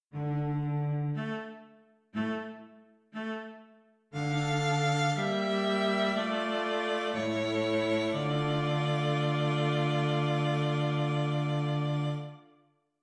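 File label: String Quartet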